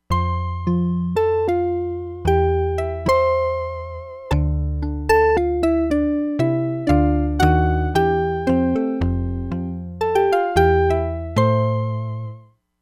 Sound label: KOTO + DIGITAL PIANO, Crumar Bit 99; Factory Programs